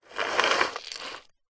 household jewelry in wooden box tip slide lid open; zapsplat